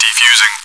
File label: defusing